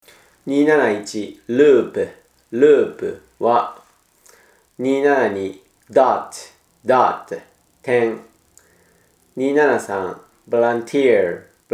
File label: tango